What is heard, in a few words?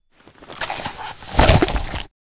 helmet on; STALKER; IWP